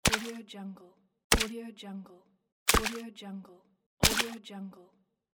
footsteps water

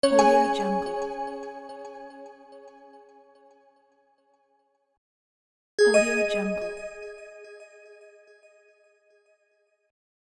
ui sounds

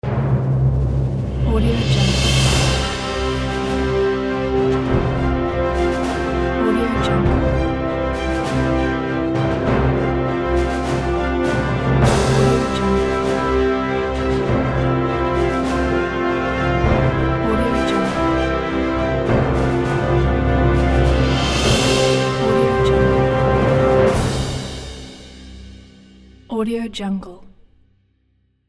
birthday fanfare logo

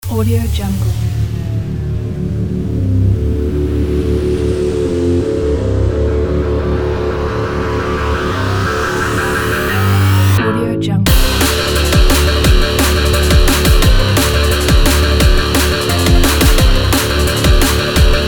stylish driving energy

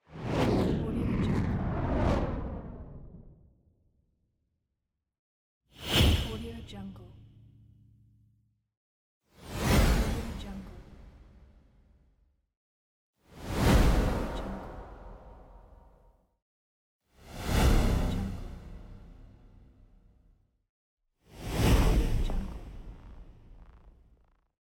scifi whoosh

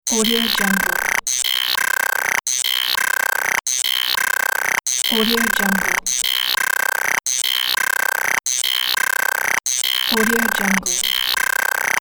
digital interface text readout

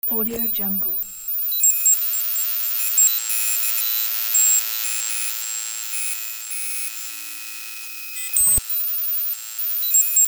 digital image noise; soundwood

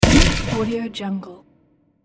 pistol gun shot

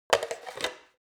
object plastic cup stack

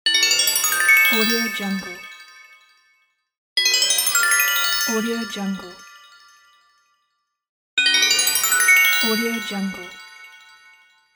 christmas magic wand

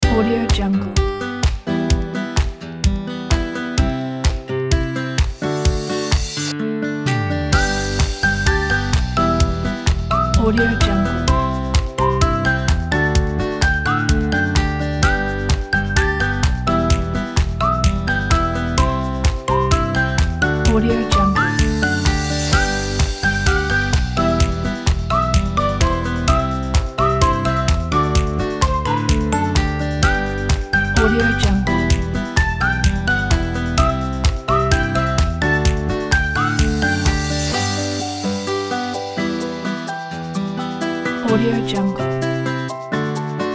acoustic happiness

